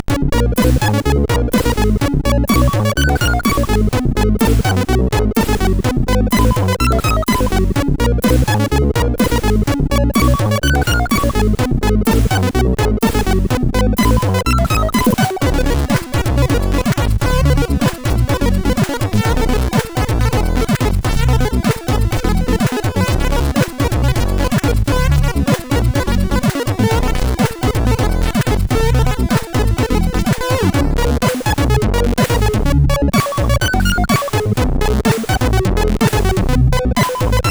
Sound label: sid CSG8580R5